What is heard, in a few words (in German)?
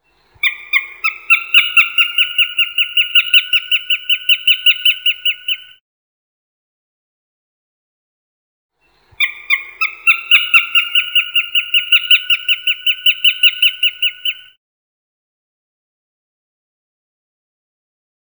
Vogelstimmenspiel Habicht